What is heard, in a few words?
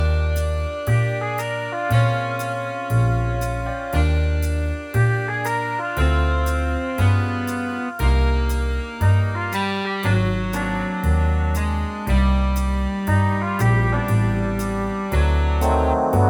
big band arrangements